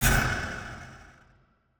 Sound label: fallen projectile